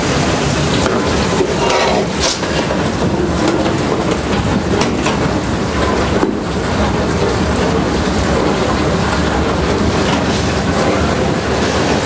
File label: showerwater